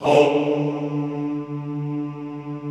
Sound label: HOMMM D2 R, Extended Classical Choir; Best Service